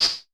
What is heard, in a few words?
HH, Vermona Mono15 Drums